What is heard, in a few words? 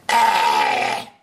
cough; H3H3